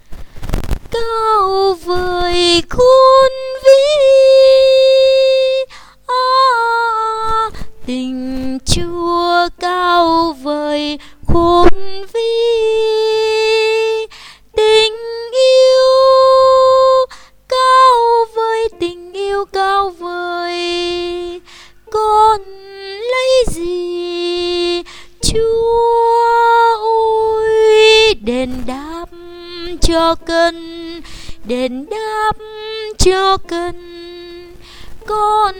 Tenor

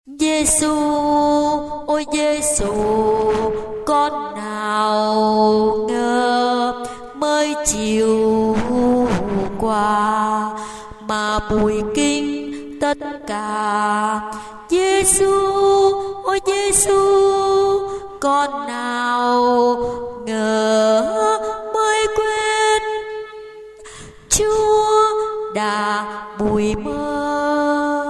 basso

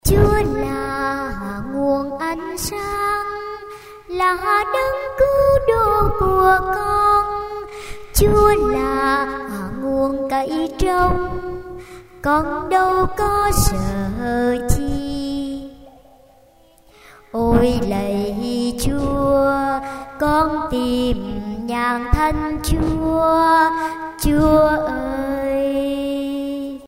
tenor basso

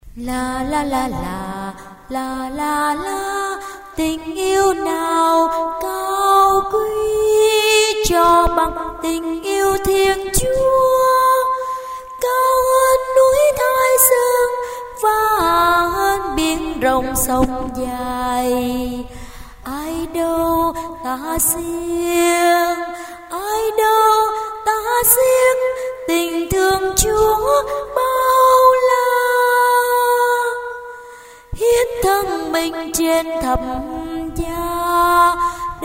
alto

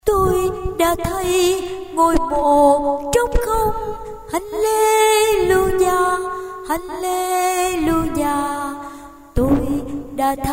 basso

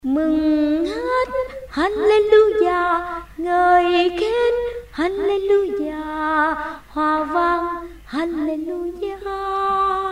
sop tenor

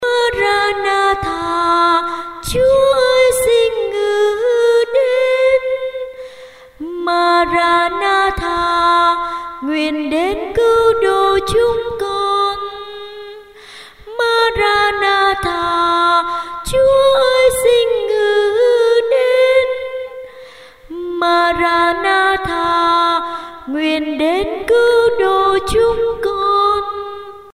tenor

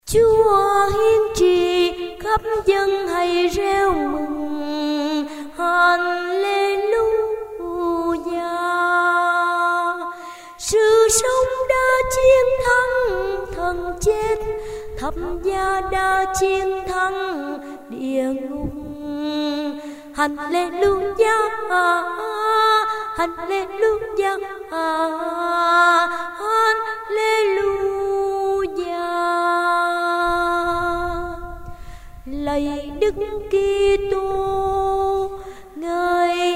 alto

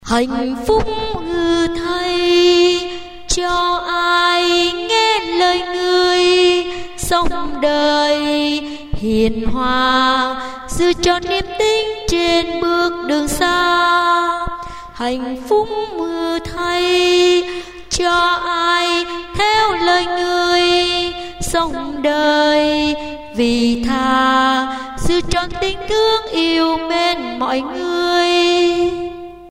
basso

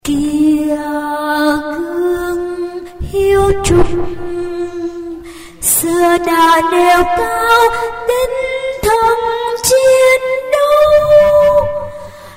tenor

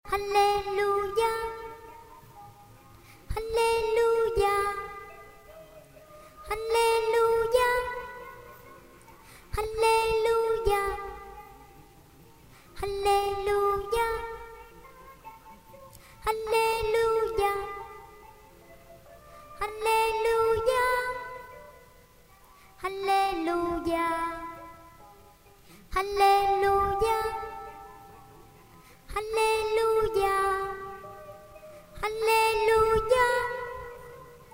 alto

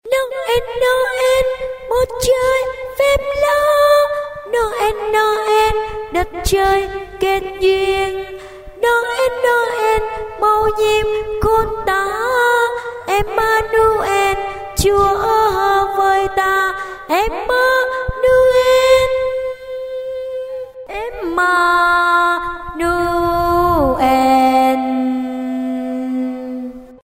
tenor

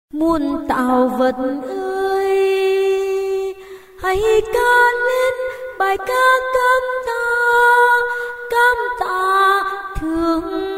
tenor

